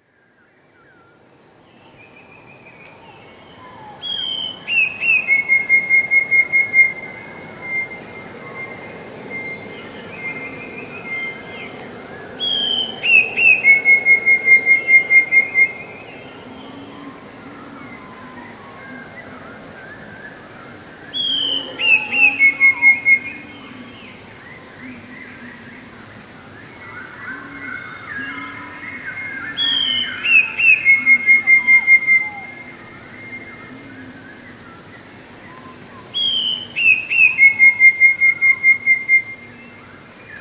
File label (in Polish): spiew; dwukrotnym zwolnieniu i obniżeniu o oktawę